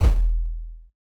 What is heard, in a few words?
digital storm cyber sub kick; nova sound